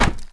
Barrel collision

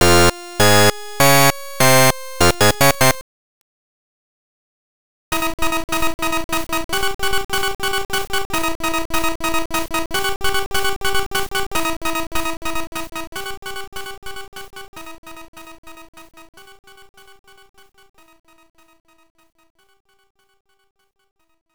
(Phase 3); Super Mario Land 2; Six Golden Coins

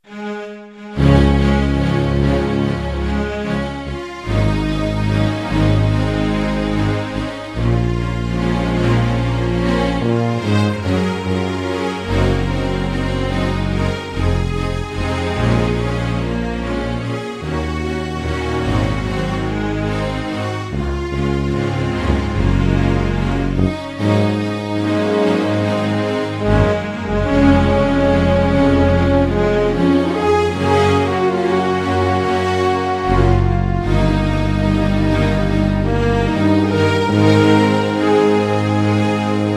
Great Aspirations; everquest gamerip

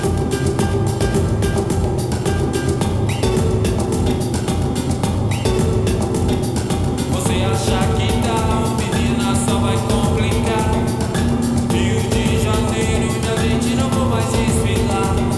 Ze Do Samba; Tom Clancy's Rainbow Six Vegas 2; PS3; Xbox 360

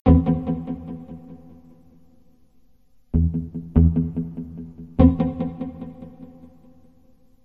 Discover Cave MUSIC

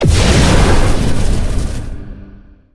Boom; Criminality

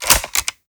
Button

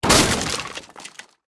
cannonbal hit ship

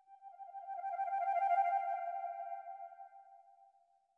flute trill; Sky Children of the Light gamerip

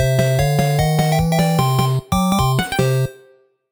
Game Over

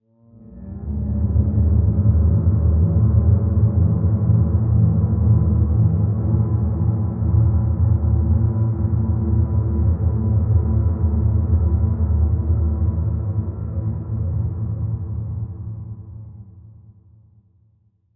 swell underworld creature moans dark low

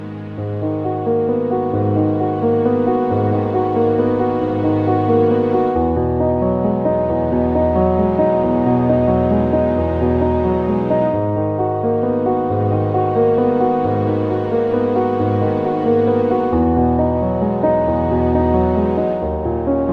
somber ab Min Beautiful Piano Chords; Castle on the Coast; PS4

